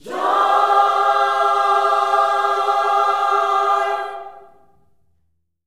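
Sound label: JOY C major, Vocal Gospel; Spectrasonics; Vocal Planet Vol.1 Gospel; akai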